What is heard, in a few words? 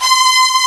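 Kurzweil F6, strings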